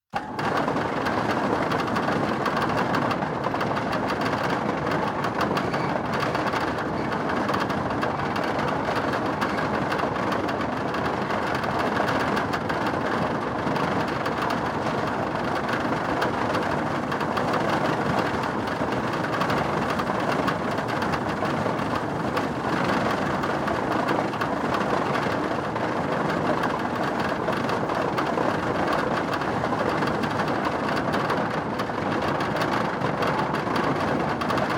Industrial Mechanical Grain Dryer Electrical Grain Coveyer Empty Start Run Stop Rattling; Hardware; Tom Hutchings